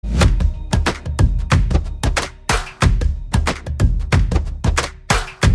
Drum Beats BGM

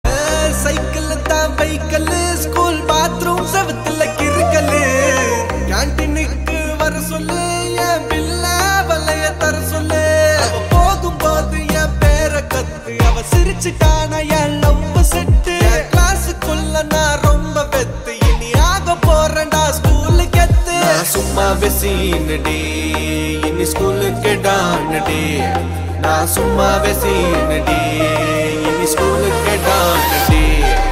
Love Ringtone

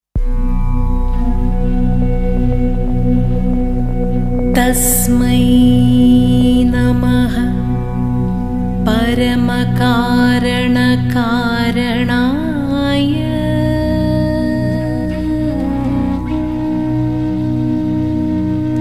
Prayer Song Bgm

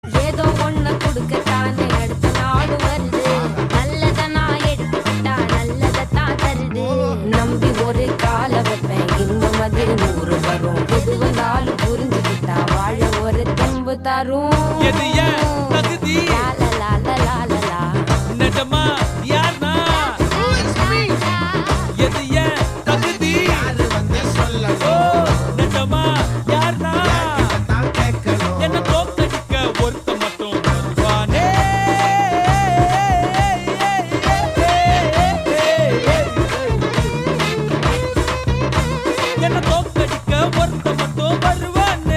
Female Ringtone

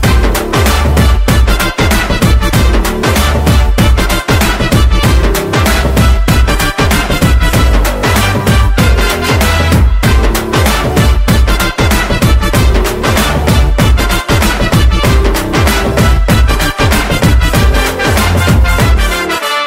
Drum Beats Tone

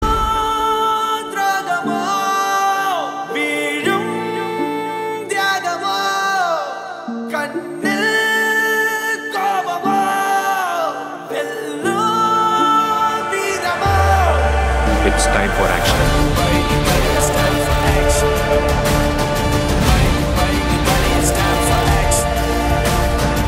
Love Ringtone

